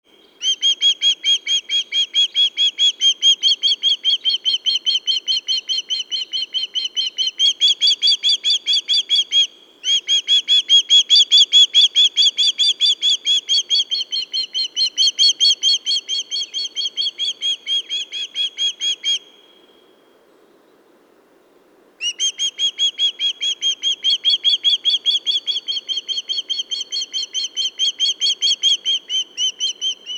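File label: skopa, osprey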